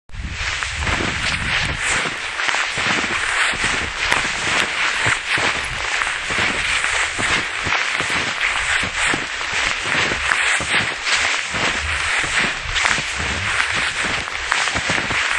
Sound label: walking in snow